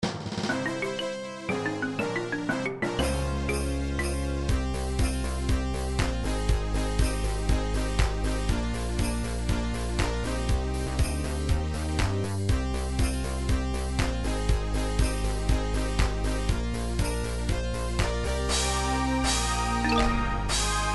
fanfare dance remix; super nintendo final fantasy ii

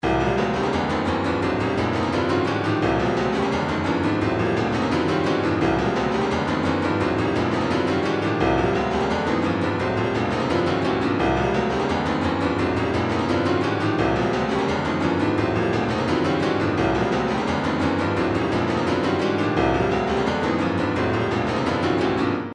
retro funk; sookie

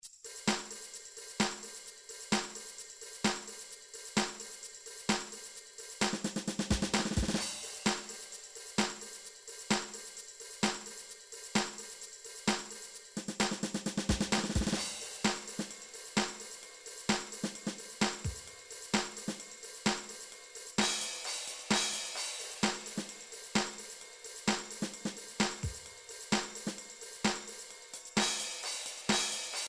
drum midi; Drum Midi Files Collection

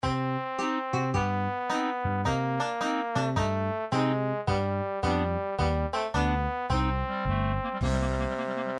game over; gameboy advance; banjo kazooie

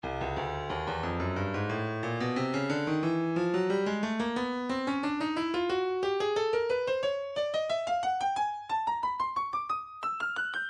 breakbeat 090 bpm; Stylus Breakbeat Midi Files; hipping a

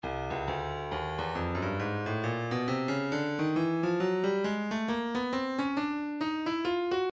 breakbeat midi files 065 069 bpm; Grime C; Stylus Breakbeat Midi Files

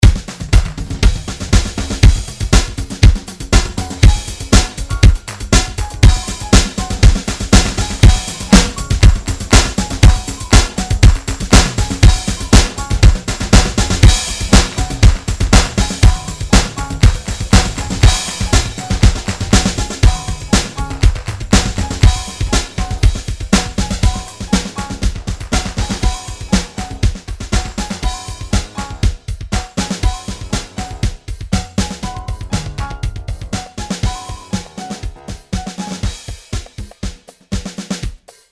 drum grooves midi loops disco; Steinberg Nice Beats 1000

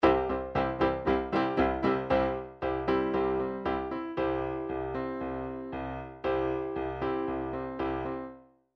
chord lines rockpop; Midi Files 1000

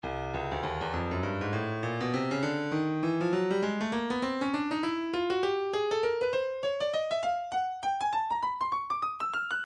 breakbeat midi 100 bpm; slugworth b; Stylus Breakbeat Midi Files

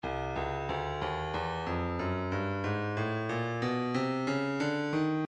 breakbeat 092 bpm hh; lexan hh; Stylus Breakbeat Midi Files

